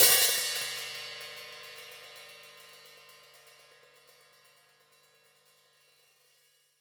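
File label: close Open Hat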